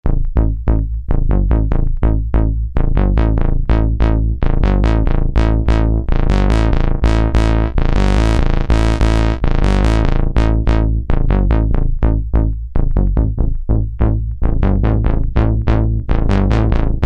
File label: e-phonic invader vsti sounds external fx